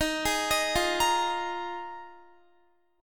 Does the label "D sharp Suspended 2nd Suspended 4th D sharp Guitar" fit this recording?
Yes